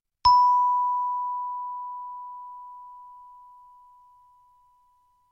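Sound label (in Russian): звонок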